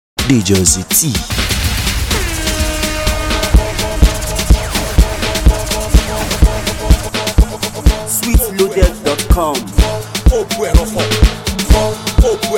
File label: DRUM TALK